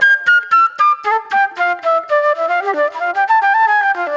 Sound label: Disco Flute